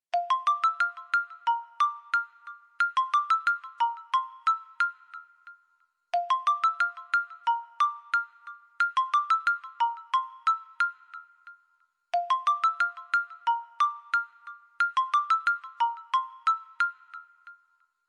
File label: Musical Scale, ringtones; Bluboo; S8